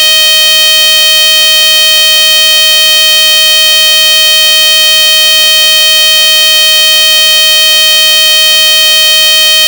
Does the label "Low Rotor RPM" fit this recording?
Yes